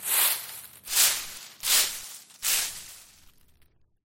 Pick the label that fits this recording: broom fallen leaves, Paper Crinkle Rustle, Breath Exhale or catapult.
broom fallen leaves